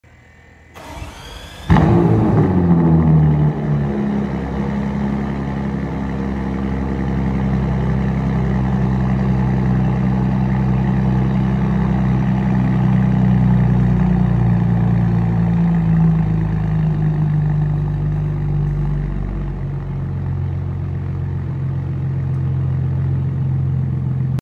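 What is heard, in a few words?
Bentley, exhaust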